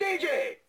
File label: DJ Button